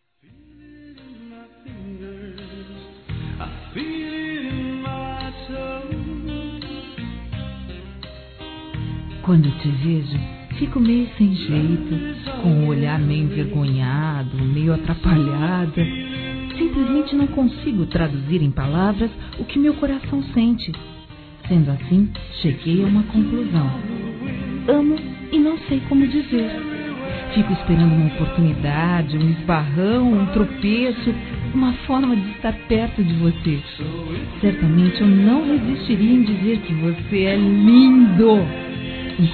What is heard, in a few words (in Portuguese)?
Paquera Convite para Sair Feminino; Conquistas Cantadas paqueras; Telemensagens; Telemensagens 2017